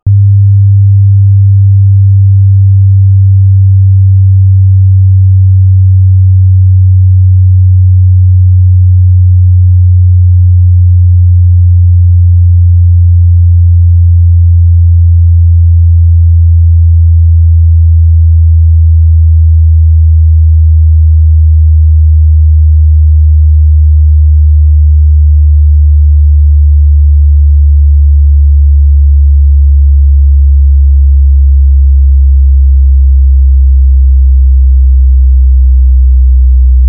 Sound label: 100 20hz sine sweep